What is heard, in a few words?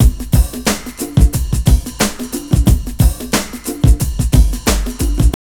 TRIP 90, trip loop